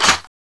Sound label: usas foley, weapons